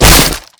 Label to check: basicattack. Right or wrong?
Right